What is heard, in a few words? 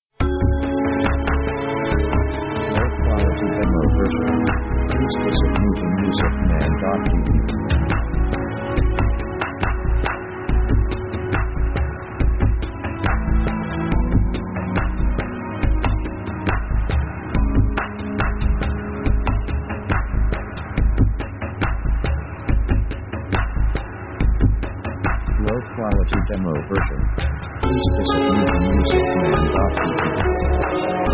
indian melancholy